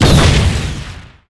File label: Enemy Fashion Damage Hit